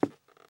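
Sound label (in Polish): kroki pojedynczy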